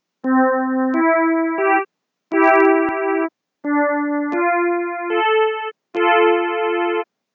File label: piano